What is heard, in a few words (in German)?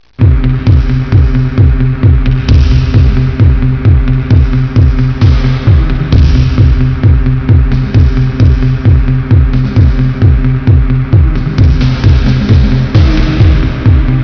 musik